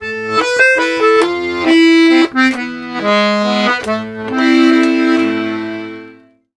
140, Complete Accordions

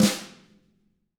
TAMA, Drums

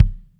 KICK, JAZZ KICK

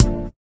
VIBRA; Spectrasonics; Metamorphosis

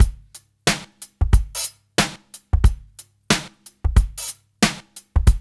DRUMS, Funky Ass Loops; East West

